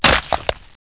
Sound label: eggs crack; ourano